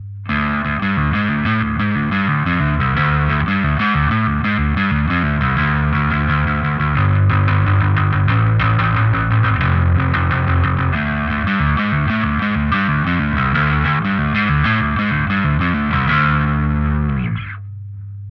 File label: Distortion Midboost Cabsim